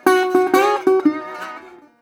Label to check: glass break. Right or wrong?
Wrong